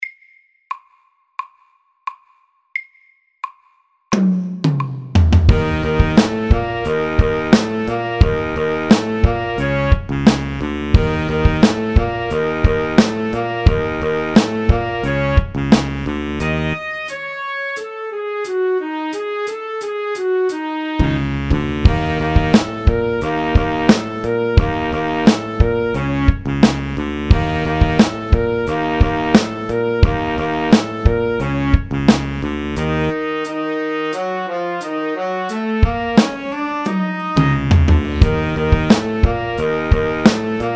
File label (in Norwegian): funk MIDDELS